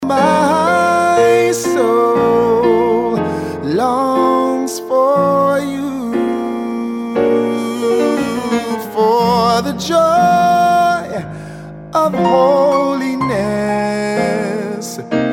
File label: ring tone